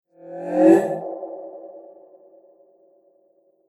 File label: Future Vroom, whoosh sound effect